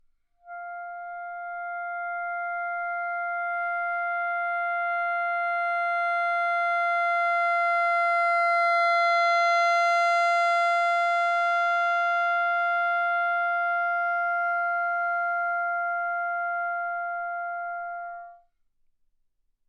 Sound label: romantic clarinet bb, Anechoic; OPENAIR